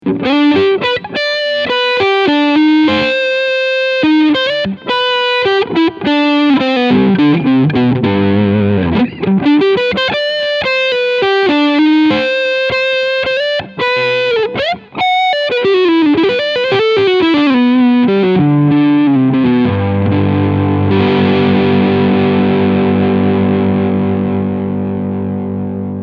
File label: Overdrive Front PU